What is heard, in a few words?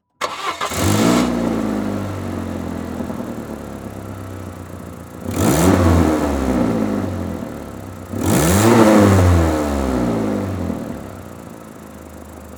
Auto Saturn Start Rev Shut Off Mic Under Car; Transportation